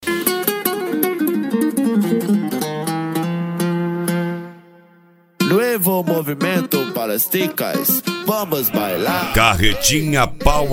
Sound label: mega funk; carretinha power sound volume 2